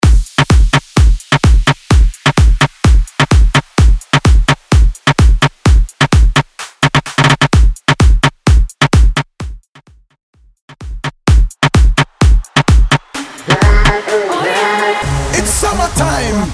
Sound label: Tribal; Tribal Edit